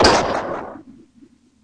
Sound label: space wobble; Weird and Interesting Hits